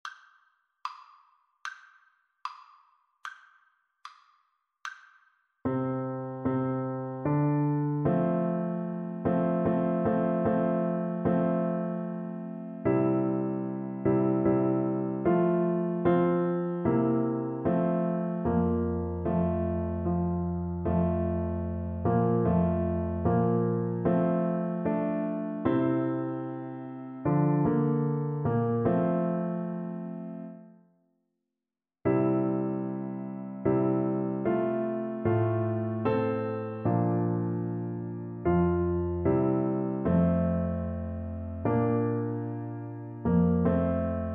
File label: Bassoon